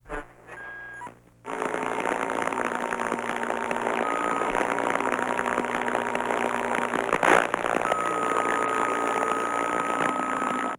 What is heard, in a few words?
Old Radio static